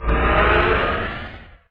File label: skull door; theGoonies; Retro